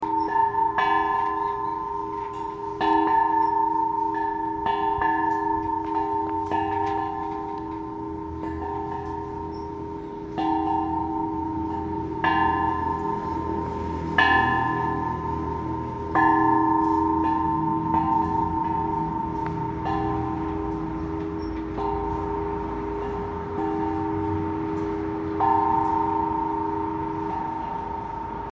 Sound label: zigzag sound